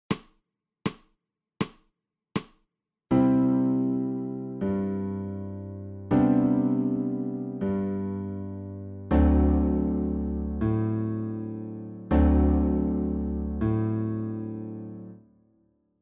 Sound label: SOPRANO